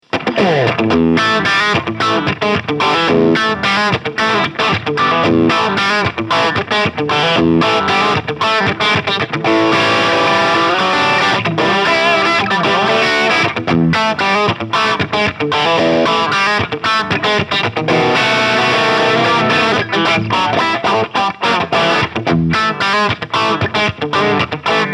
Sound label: Friedman T 7020 Crunch